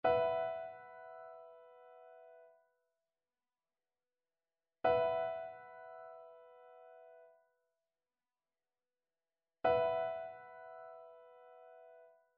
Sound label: trichord; trichord identification